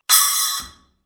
splash, cymbal; sabian

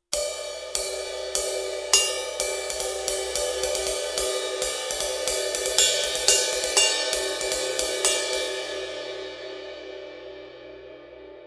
classic ride 20, cymbal; sabian

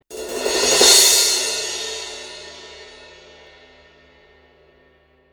dark crash 16, cymbal; sabian

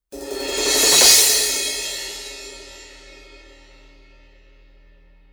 dark crash 16, cymbal; sabian

